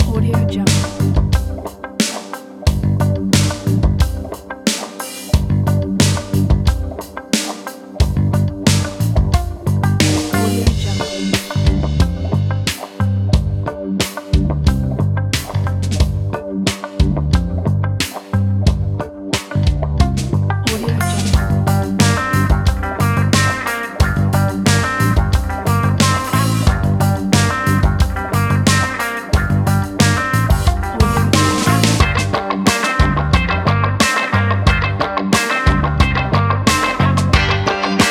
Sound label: funk kit